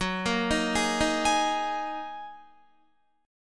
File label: F sharp 6th Add 9th F sharp Guitar; F#6add9; 13,13,15,13,15,16; arpeggiated